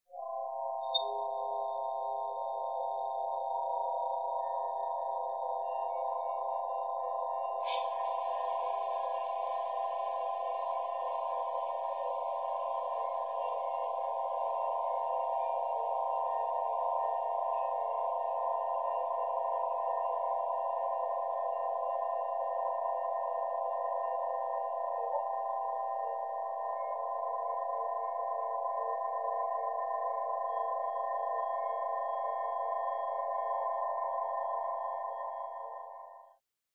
Spaceship Interior; Ambience With Steam Machine Blasts And Beeps